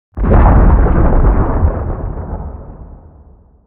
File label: tower distant; building; damage